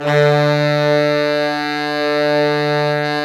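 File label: low brass, fat pop section; Roland L-CD702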